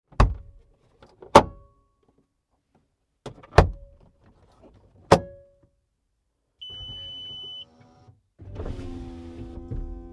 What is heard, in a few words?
Car Electronic Roof, Into boot - unlock catches - bleeps - windows down; boot opens - roof In - boot close - beeps - windows clos; Tom Hutchings